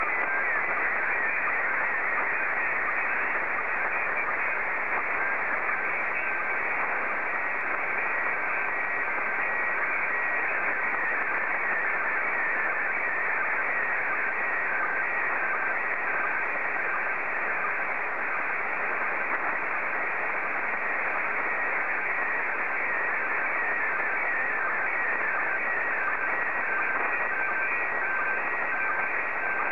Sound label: SSTV, PD90